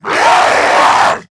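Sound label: attack, zombie male; nmr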